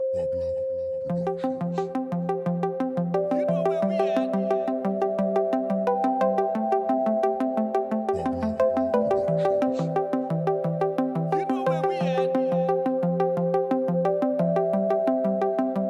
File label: POP EDM